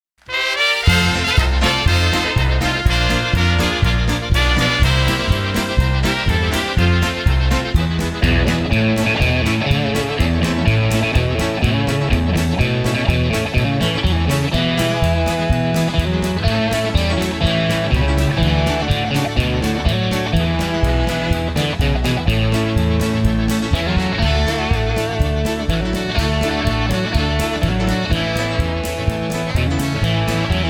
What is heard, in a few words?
Polka